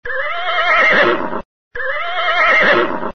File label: Horse Whinny Sounds Car Horn